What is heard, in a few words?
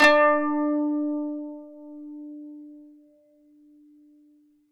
KANUN D4; SoundBytes; Early Patches; KAN CHOR BIG